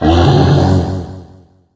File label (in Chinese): Bear dep, 召唤熊灵布鲁斯的音效; 部署音效 dep; 妮塔; 沙漠世界; 荒野乱斗